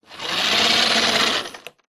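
leisure radio controlled car off push carpet short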